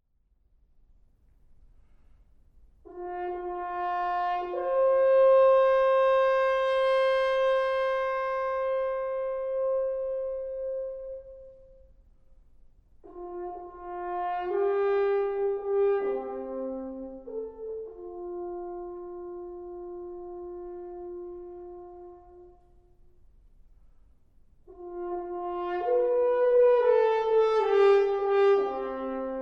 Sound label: Serenade for Horn, Tenor & Strings, Prologue